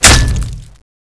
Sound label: cbar hit bod; z-lapik ru